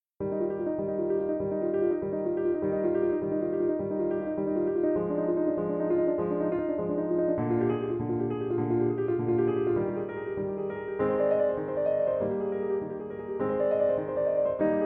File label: backing tracks